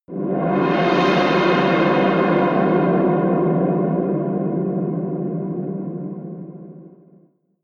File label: reverby spacy scrapes, Hits/Impacts; whooshes rises